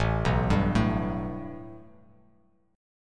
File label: A 7th Suspended 2nd A down; A7sus2 arpeggiated